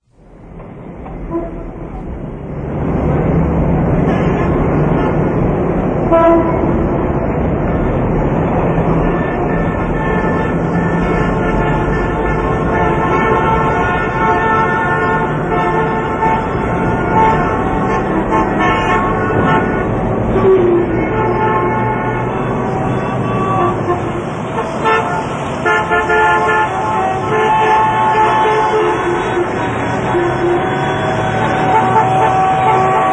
city car noise

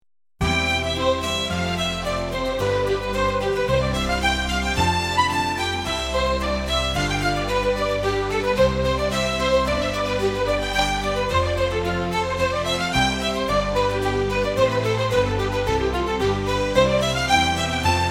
scottish majesty, volinki

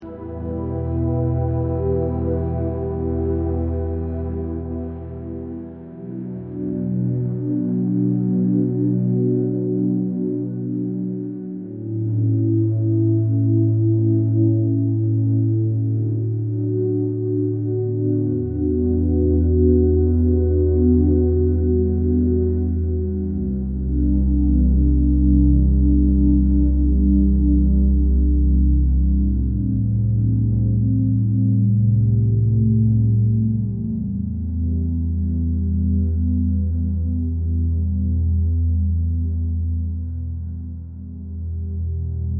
mystic waters, ambient

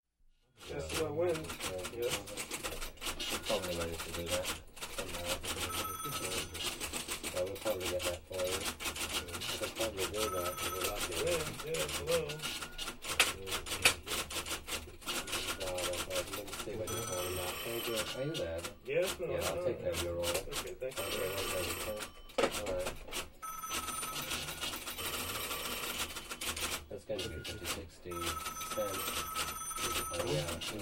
office general atmosphere

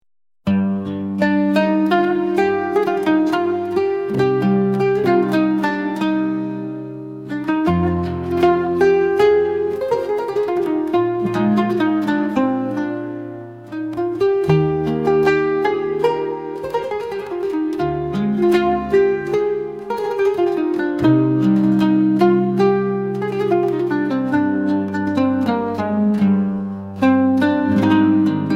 whispers of the breeze, arabskaya

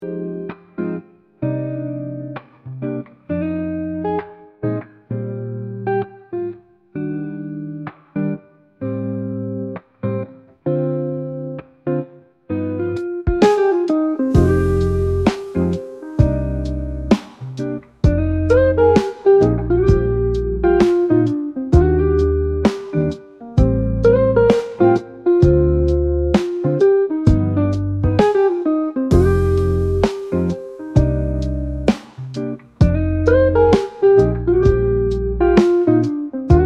serene night stroll, bezmyatezhnaya

